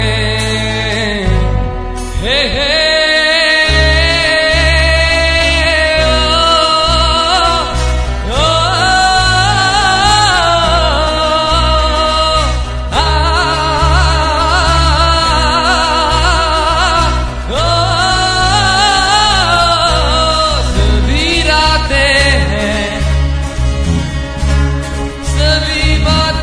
rock on ringtone

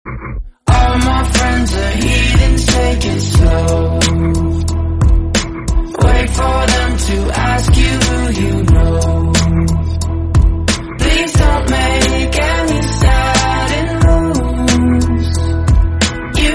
english song tone ringtone

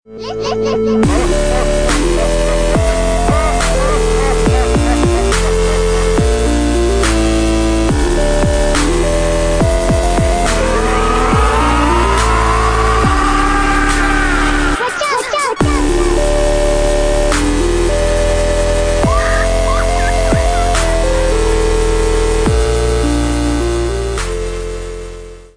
dubstep ringtone

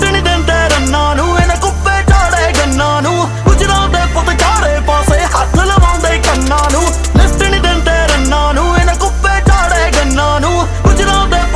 punjabi ringtone

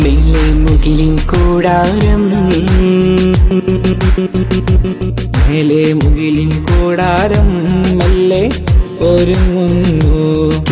malayalam song ringtone

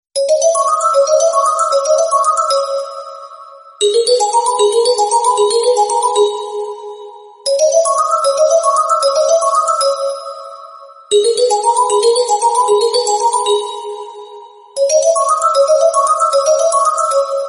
suspicious mystic call tone; nokia ringtones

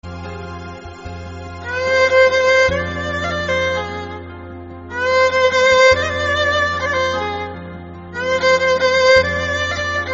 instrumental ringtone; bollywood

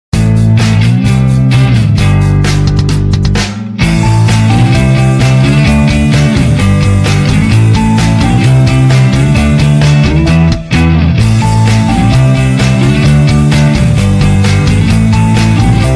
nokia bobble ringtone

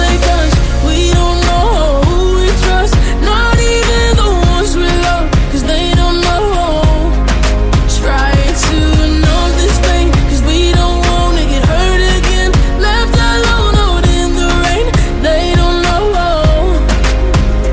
english ringtone

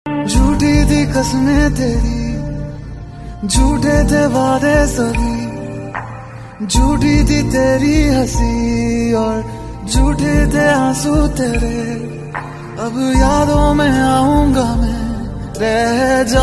ringtone, bollywood ringtones

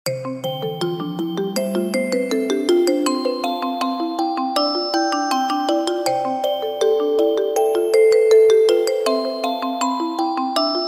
vivo ringtone; labyrinth

